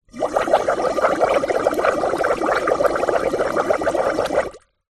household water bubbles through straw into metal pan